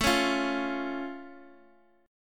A Augmented A down Guitar Open D

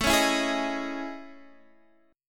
A Major Sharp 11th, Guitar, Drop D

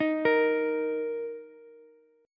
Eb 5th, Ukulele; x,x,11,13; JGuitar

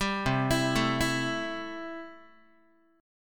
C 6th, Guitar; C6 arpeggiated

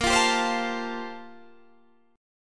G Minor 11th A sharp

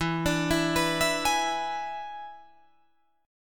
E 7th E down Guitar Drop D

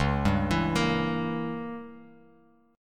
Db Diminished 7th Db down Bass Standard; arpeggiated; JGuitar

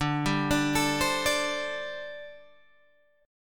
D 7th Suspended 4th, down, Guitar, Open G

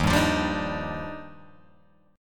D sharp Major 9th, Guitar Open G; strummed

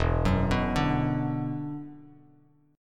E 7th Suspended 2nd, down, Bass Standard; E7sus2; arpeggiated

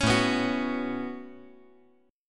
A 6th Add 9th A down, chord

